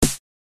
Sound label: 909 snare sample; djtyro